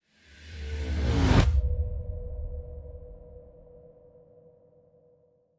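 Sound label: Music Ambient Trans Pre race start; Forza Motorsport 6 Apex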